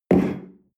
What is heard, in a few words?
espresso place tablecloth slide, restaurant cafe